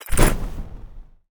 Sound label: Pickup Firestone; we are the dwarves gamerip 2016